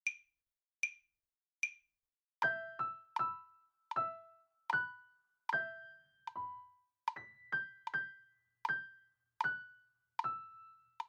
Sakura Piano langzaam

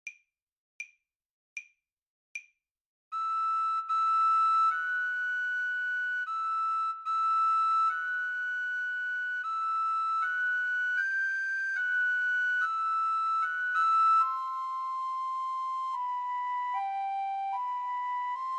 Sakura Solo Blokfluit

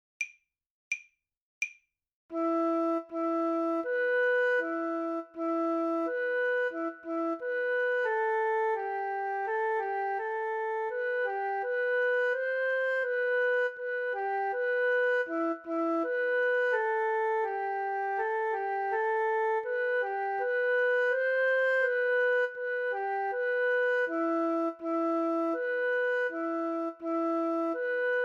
Sakura Fluit A easy

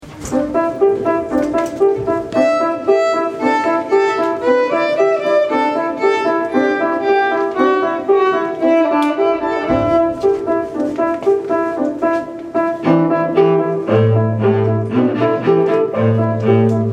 Pianotrio

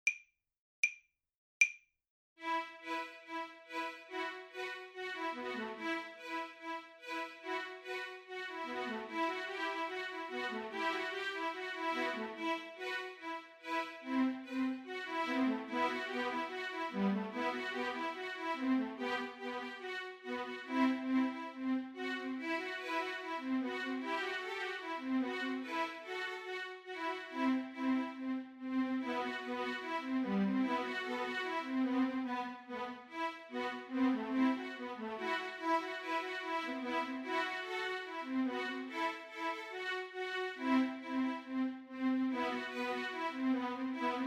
Altviool Solo; Sakura

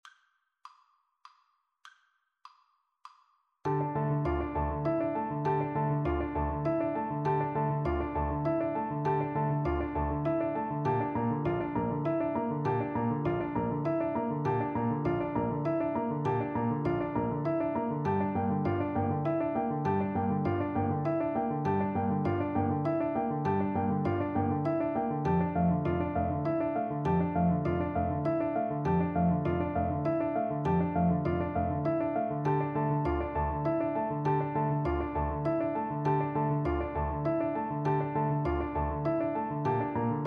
Ostinato met metronoom

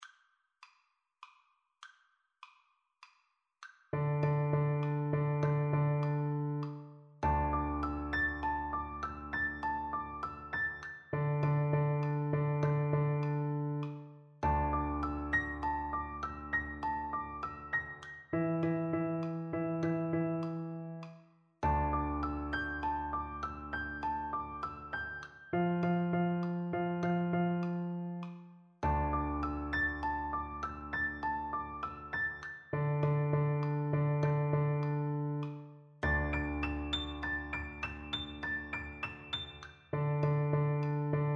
Ostinato met metronoom

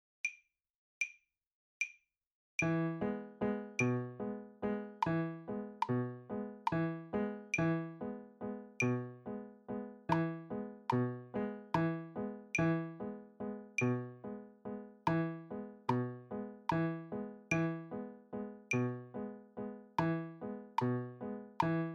Sakura Piano langzaam

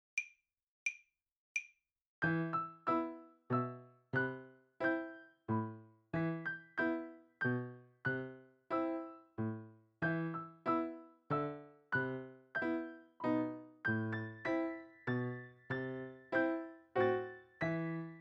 Sakura Piano A

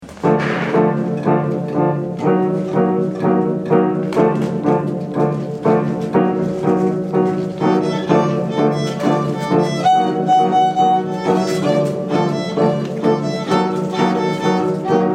Pianotrio

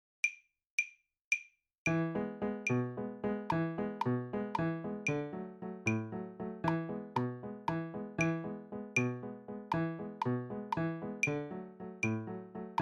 Sakura maat 299 t/m einde piano

